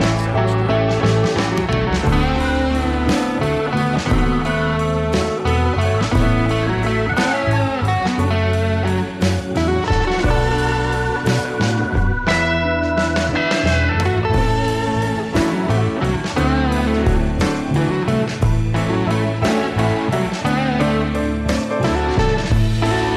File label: Blue instrumental bridge